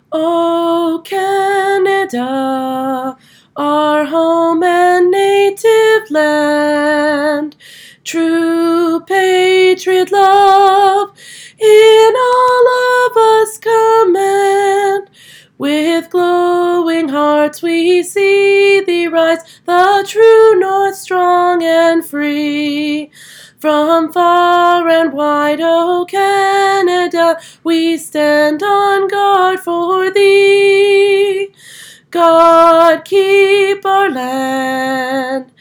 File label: O Canada Melody